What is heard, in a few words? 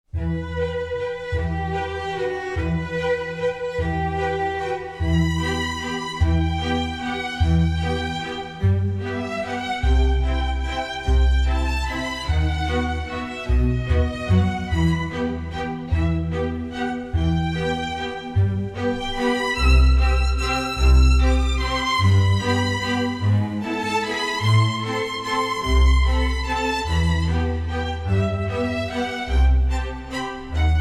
Waltz in E minor